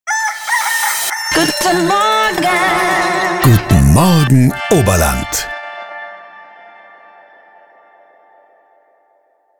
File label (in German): Jingle Morgen; RADIO OBERLAND